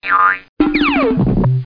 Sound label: jump down